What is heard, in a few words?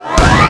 attack